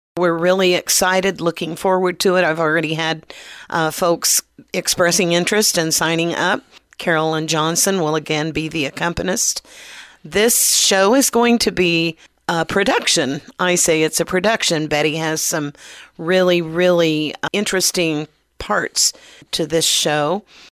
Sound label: Choir